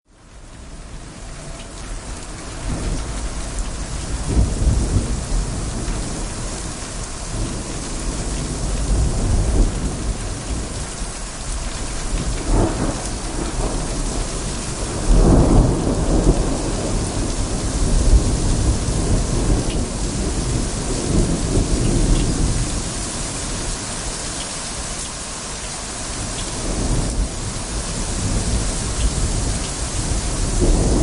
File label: thunderstorm with heavy rain